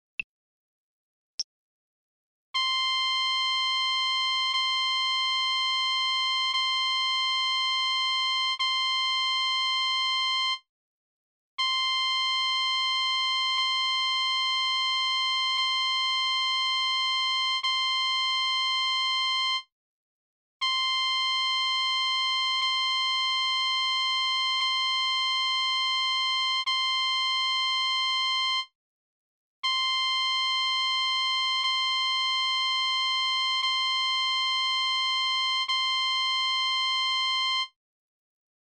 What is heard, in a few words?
c trumpet